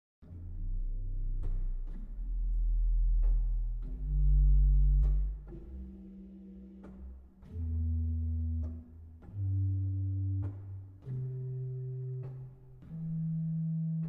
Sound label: Bourdon arp; Mainz